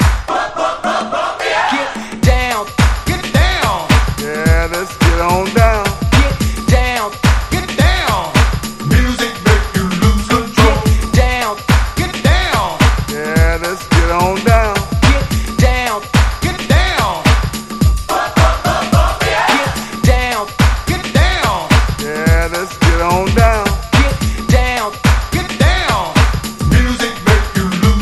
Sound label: DRUMS